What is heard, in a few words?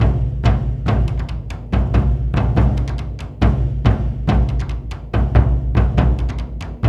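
140 bpm, Asian Daiko Rolls; Spectrasonics; Supreme Beats; World Dance; Daiko Ens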